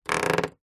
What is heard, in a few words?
household door wooden creak hinge short